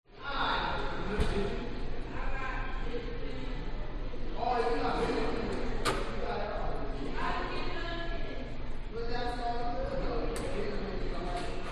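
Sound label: High School Gymnasium; audio hero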